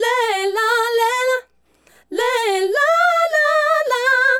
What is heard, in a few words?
Female Group, Hip-Hop and RnB Vocals